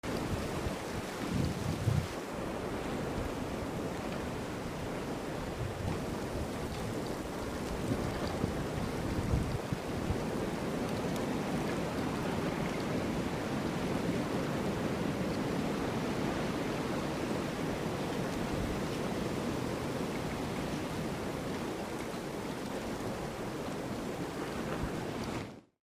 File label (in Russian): Zvuk vetra so snegom